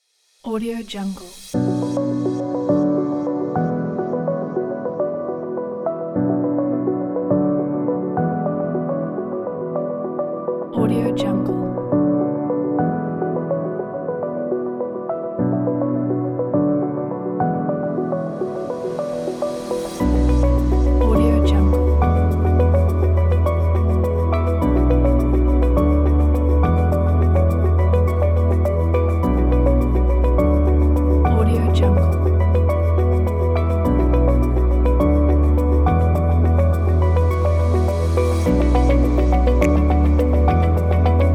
documentary soundscapes kit

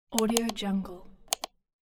laptop touchpad click

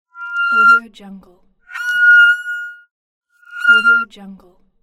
bicycle brake squeaking

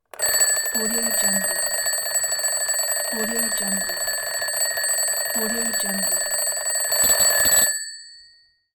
analog alarm clock with bells inconsistent

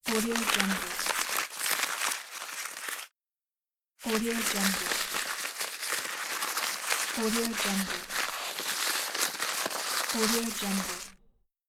paper crumpling

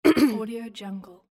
female clearing throat

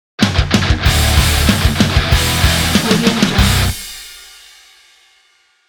metal intro logo

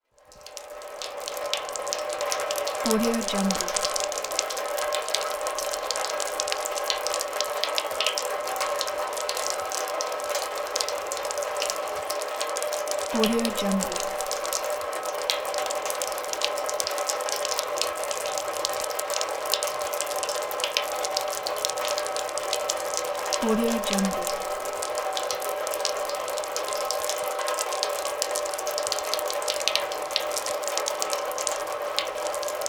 water pouring on metal

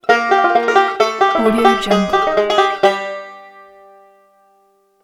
banjo fingerpicking

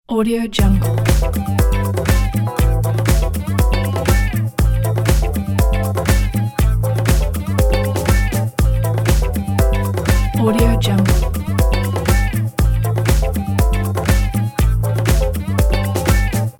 total funk loop

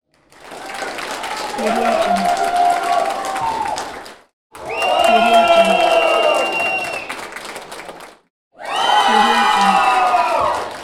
cheers

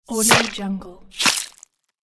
game stab blood hit

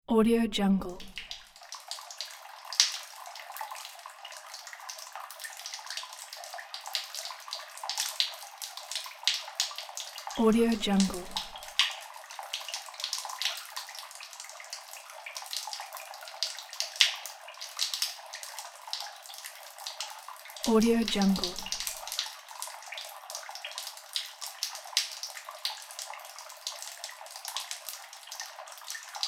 water drips

